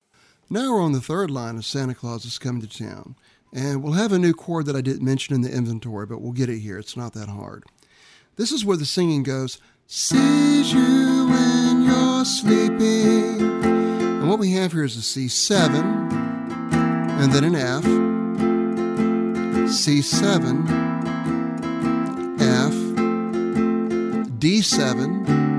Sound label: Strum Along Christmas Bari